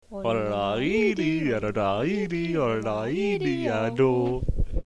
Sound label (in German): Jodler